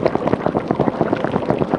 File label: pot bubble; rozaic